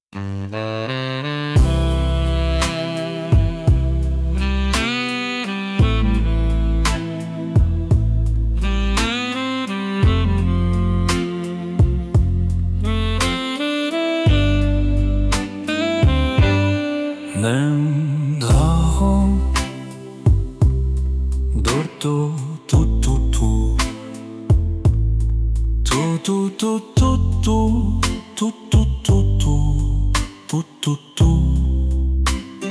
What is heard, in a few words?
velvet saxophone